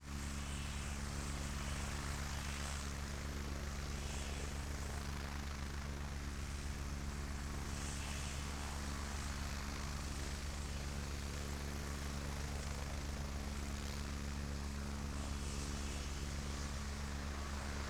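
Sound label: agusta a109 exterior idle turbine rotors alternative tones left distant; Pole Position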